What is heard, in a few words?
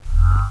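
Wood Monster Attack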